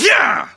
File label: melee attack